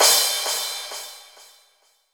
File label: normal hitfinish; nautzz; Manuel Schleis